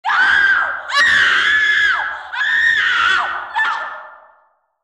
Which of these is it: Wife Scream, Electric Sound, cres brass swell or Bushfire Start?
Wife Scream